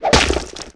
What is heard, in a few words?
tentackle; combat; enemy